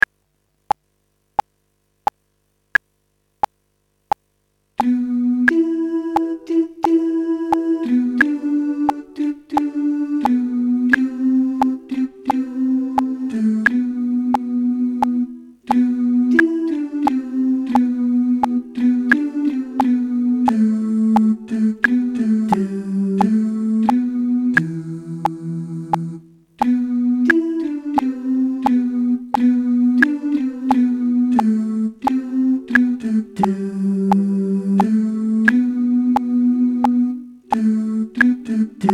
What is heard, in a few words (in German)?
Maenner